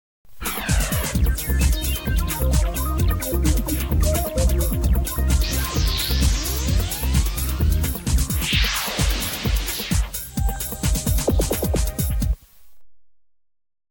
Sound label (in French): jingle telefilm